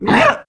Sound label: female zombie attack; nmrih; zerosiki; slender